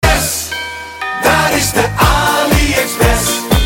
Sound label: DingDong; AlieExpress